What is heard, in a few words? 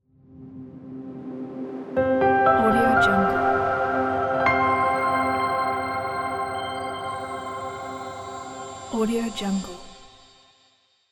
clean logo